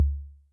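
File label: Analog Tom, Yamaha DTXpress; Electric Tom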